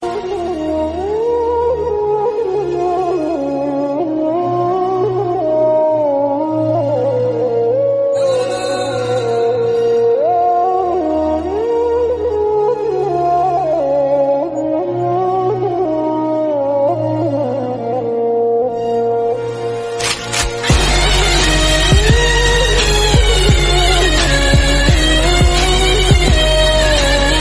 Sad Instrumental Ringtone; ringtones; Ertugrul Ghazi

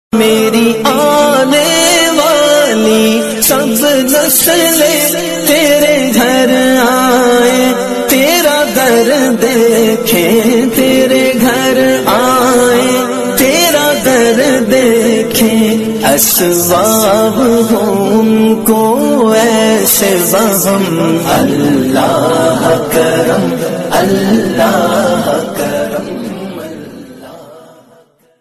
Islamic Ringtone

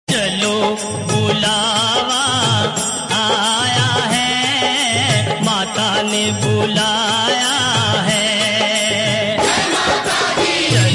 Bhakti Song Ringtone

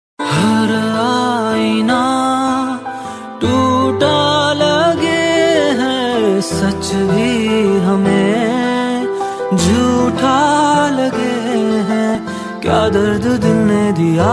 Heart Touching Ringtone

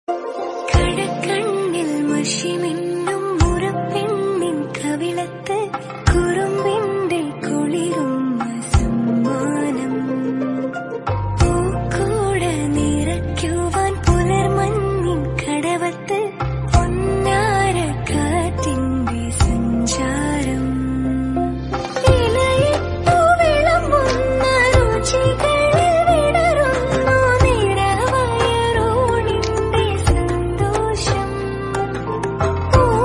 Female Love Song Ringtone

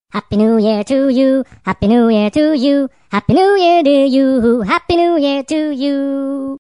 Happy New Year To U Ringtone

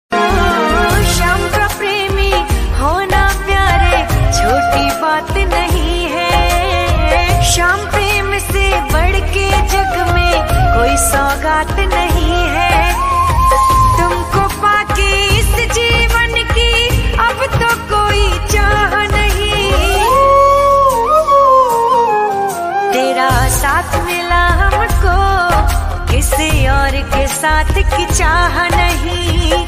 Bhajan Song Ringtone

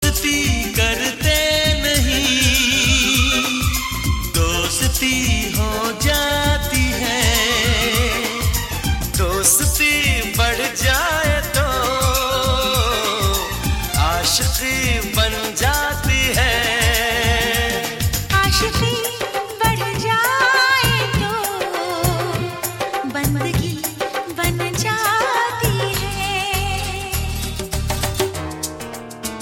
Ringtone

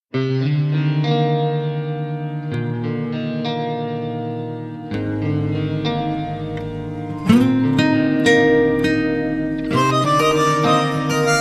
Romantic Music Ringtone for Mobile

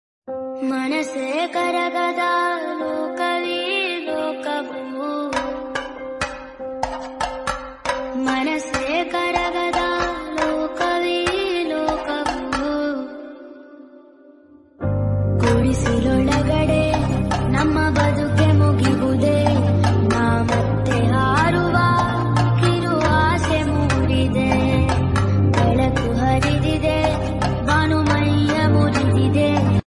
Kannada Ringtone

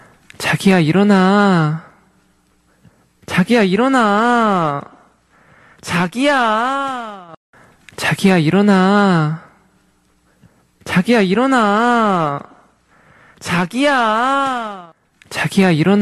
Alarm

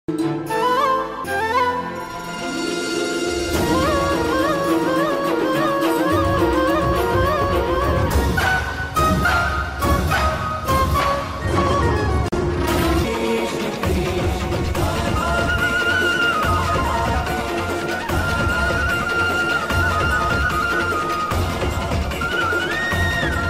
Radha Krishna Flute Ringtone

